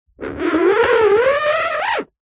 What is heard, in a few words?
poster holographic scratch grooves with fingers whizz sound